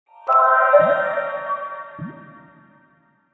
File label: Splash Screen; sonic branding; konnektedstudios